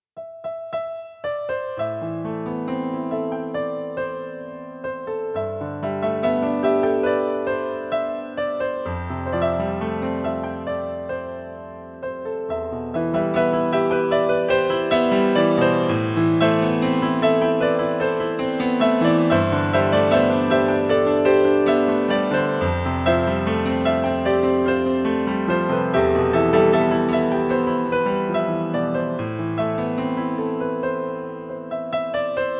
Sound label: Piano